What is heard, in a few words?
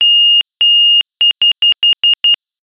Minitor VI Tone